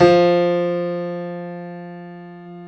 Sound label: Piano Grand; CMI